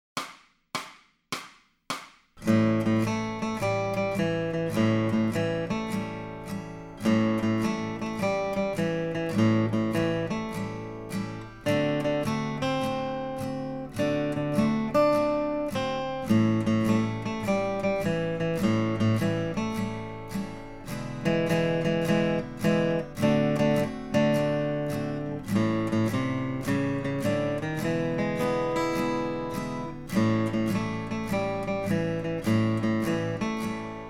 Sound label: Shufflin Blues, acoustic guitar; Grade 2